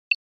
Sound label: Button Small Rubber B